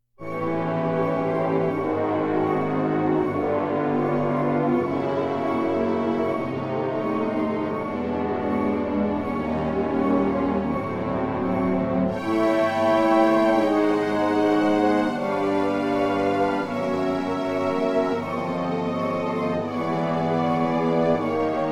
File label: Combat Death Music